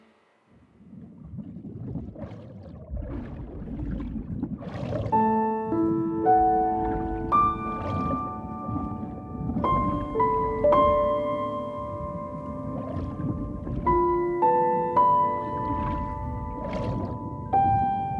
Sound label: Bgm Login; Afterimage